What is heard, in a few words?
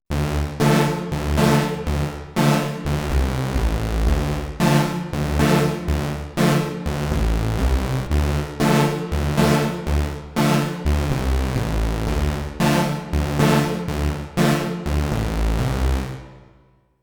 Scream Uni Lead